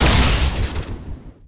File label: fuzz explode; Tanks